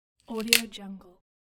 wood splintering

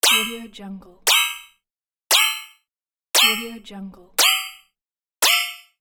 metal game button

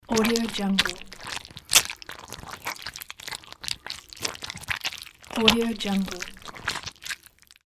juicy gut squishy

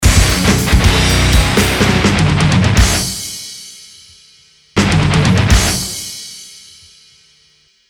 metal logo

